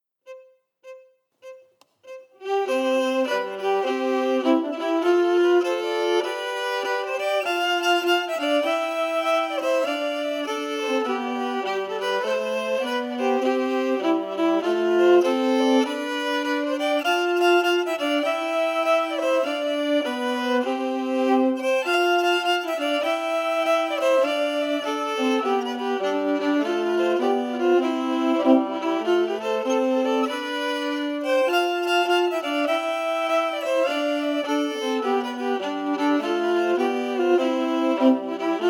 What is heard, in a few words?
The Cellist harmonies emphasized